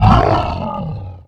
hurt

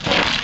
grind

